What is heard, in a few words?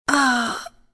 death, female; csco; csgo; zbm3